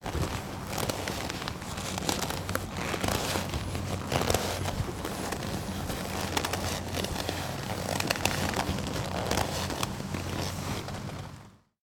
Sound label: Wearing Leather Jacket